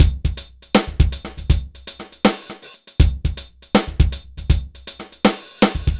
dry Loop BPM 80 Dry, AKAI S6000

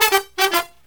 Brass Riffs

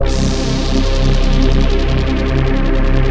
C3, SYNTH; BROADCITY; Q Up Arts; Dream Experience

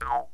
JEW'S HARP

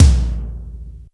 D70 drums; Crypton - DrumBase5000

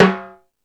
Latin Drums, E-MU Pro-Cussion

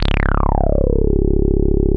C#2, Bass; CRANDLE; E-MU Producer Series Vol. 6 – Analogia Project (CD 1); Boom da Bass 1

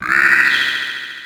GARGLEY, Synth FX Misc

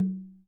PAKHAWAJ, DRUMS OF IND; AKAI S6000 CD-ROM; WorldSounds

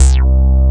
JV BASS, JV-1080; Syntec; Wall of Sounds VOL-2; BASIC-JV1080

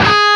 LEAD G#3; East West; Steve Stevens Guitar Samples Collection

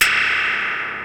NOIZE; Rubber Chicken Software Sample Bank

